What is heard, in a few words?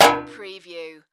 Metal Bang